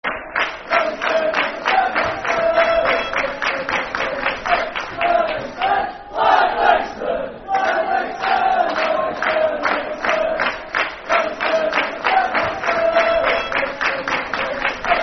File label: Livingston, Livingston, Livingston Football Chant (Soccer Song)